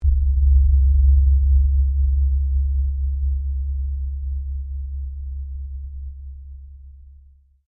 LOW FREQ STRIKE METALIC, Low Frequency sweeteners; Stabs; Sound Design

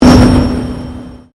Convolution Pulse

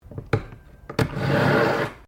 KNIFE SHARPENER USE

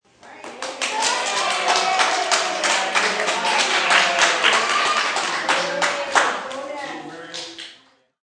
Small Crowd Clapping, Crowd claps; Birthday party sounds; Crowd Sound Effects; Crowds (Sound Effects:Backgrounds)

